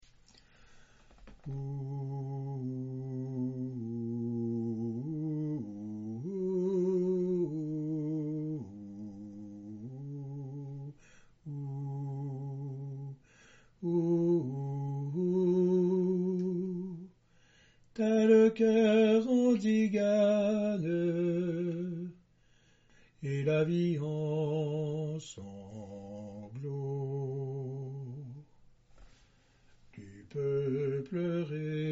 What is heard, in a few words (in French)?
basse